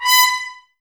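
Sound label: Brass trumpets B, Mute; Miroslav Vitous - Woodwind Brass Ensemble; Tps Mute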